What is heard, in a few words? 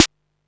SNARE, Techno 138 BPM